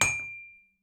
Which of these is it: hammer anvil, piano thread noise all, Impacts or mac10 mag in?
hammer anvil